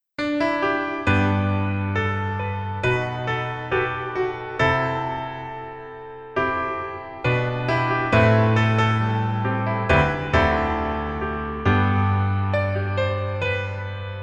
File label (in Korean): Piano ver, 멜로디MR -1키